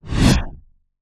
Swell Flanged; bottlerocket

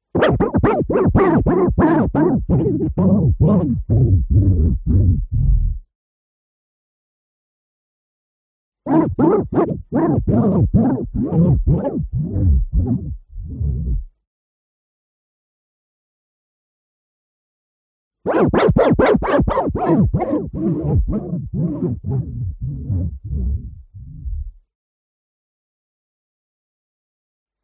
tape recorder reel clean scratch long

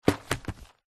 wrapping paper roll set down; zapsplat; foley

